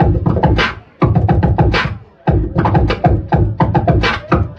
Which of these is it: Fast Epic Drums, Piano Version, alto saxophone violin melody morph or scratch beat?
scratch beat